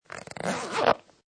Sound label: Zipper Zip Up; Zippers